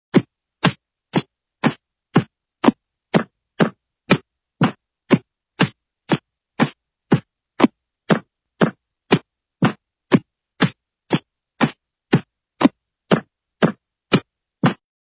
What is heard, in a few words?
Foot Stomps